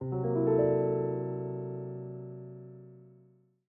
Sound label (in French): jingle vaisseau; ship reveal